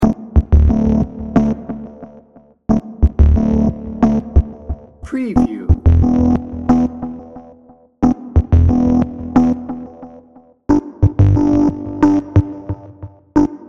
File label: exotic mystery dnb